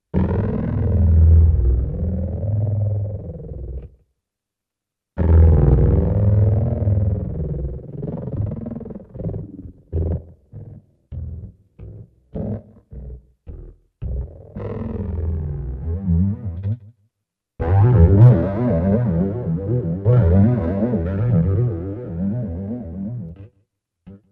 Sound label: micro macro, keyboards